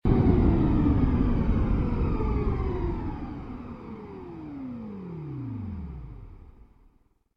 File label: engine stop, aircraft; combat; CosmicRage; CosmicRageSounds